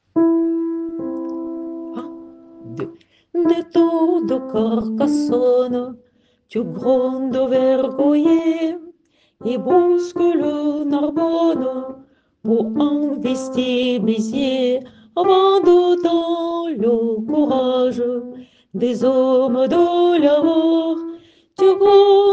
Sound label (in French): Vent d'Autan couplet Alto